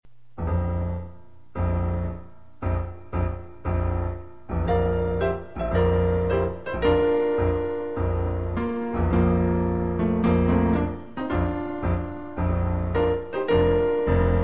Medley Playing Piano

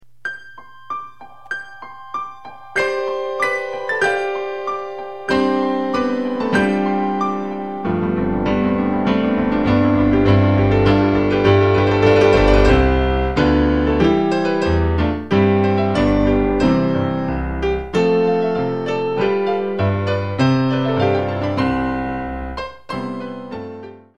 Adv. Piano Duo

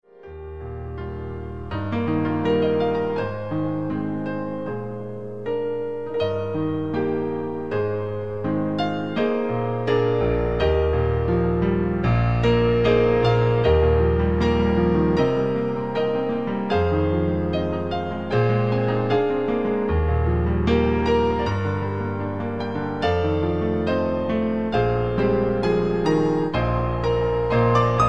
Advanced Piano Solo

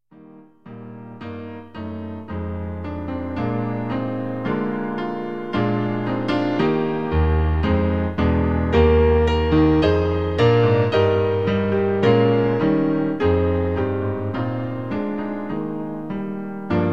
Onward Christian Soldiers piano

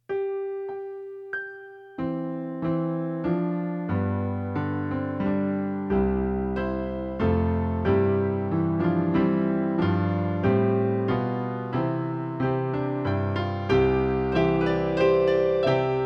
Piano Trio

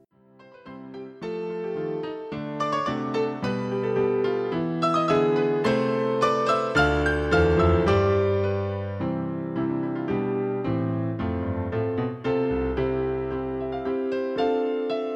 duet

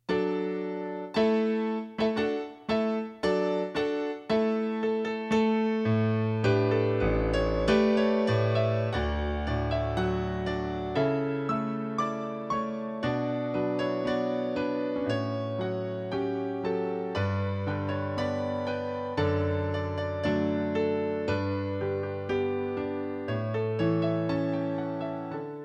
Canon in D Bridal Processional

